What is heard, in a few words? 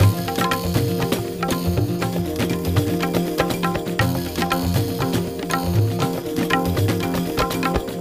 percs; music world